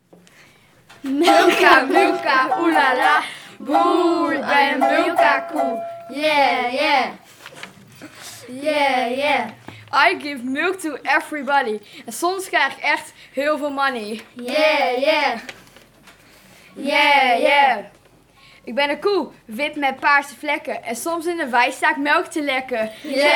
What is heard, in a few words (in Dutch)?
rap